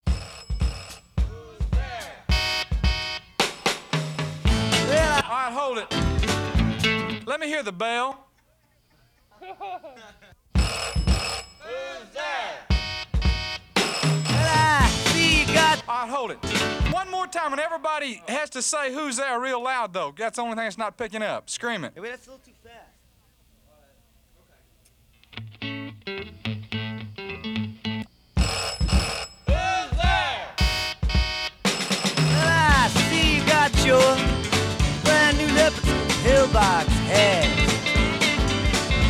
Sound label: rehearsal